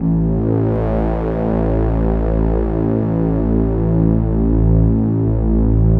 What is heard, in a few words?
Deep Drone